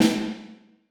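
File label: snare right hand ff, percussion; Sonatina Symphonic Orchestra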